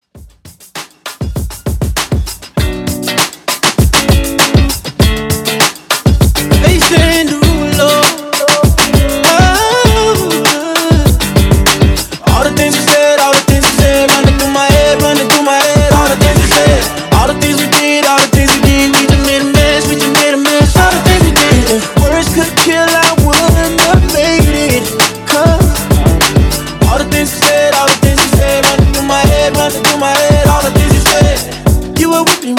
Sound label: No Intro Outro 99 BPM